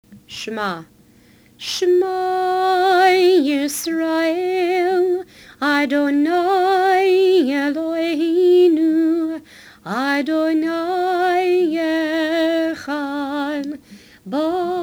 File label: Cantor Shma traditional